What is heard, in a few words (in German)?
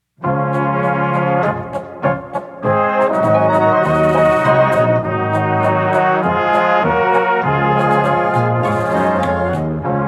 Polka